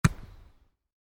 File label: Volleyball (Exterior): Block Soft; Sports; Miscellaneous Sports